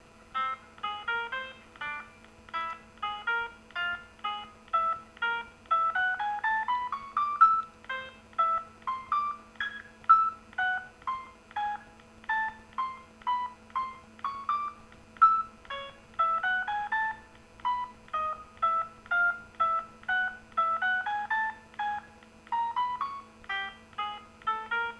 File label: With One Note Default; dom sonification; Baidu